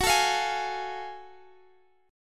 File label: F sharp Minor Major 9th, Guitar Drop D; chordshape; down